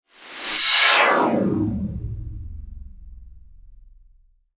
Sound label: Science Fiction UFO Flyby Transition